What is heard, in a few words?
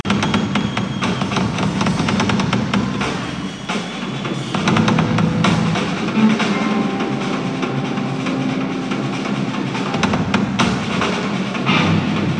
Drum Solo